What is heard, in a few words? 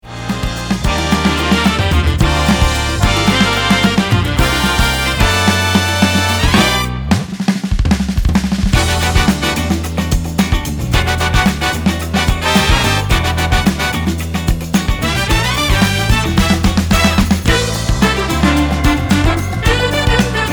Full Throttle Funk